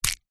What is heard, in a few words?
food chocolate small foil wrapped catch in hand; zapsplat